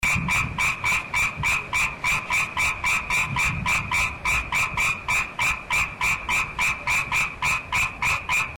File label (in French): hyla froid; Pelophylax ridibundus